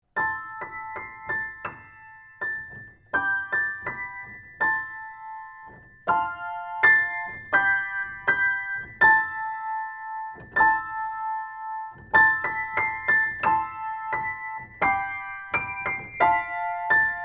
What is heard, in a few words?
Piano; AMI; Schoeps CMT541